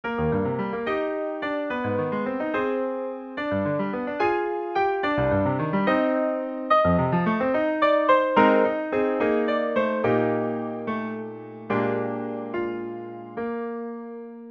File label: Valse